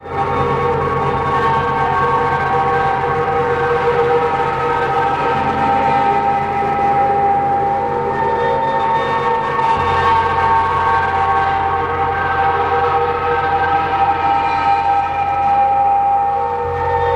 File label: metal sample; rm70